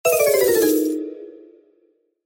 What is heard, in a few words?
slot deduct